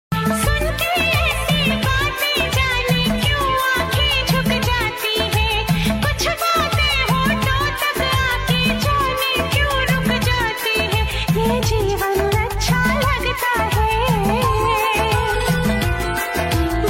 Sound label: ringtone heart touching ringtone sad ringtone; maharaja